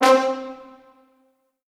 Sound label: C3 L, Orchestral Brass